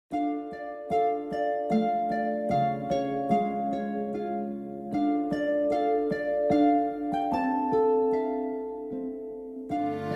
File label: chamber strings and harp version; Mystical Places